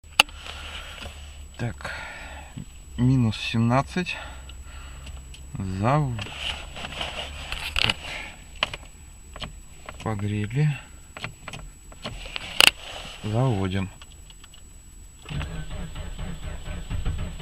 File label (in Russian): zavodim, suzuki; auto